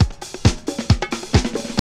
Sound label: house loop